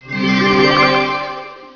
holy vision, spells; humans; war2

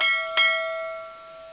shipyard; war2; humans buildings

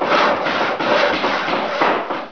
lumber mill, buildings; humans; war2; alliance sounds

